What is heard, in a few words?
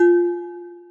celesta, programmable speaker